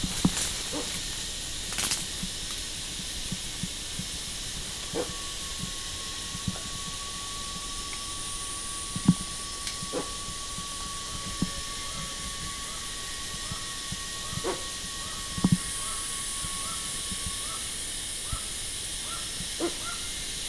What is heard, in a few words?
Sira curassow, پرنده کاکل فری سیرا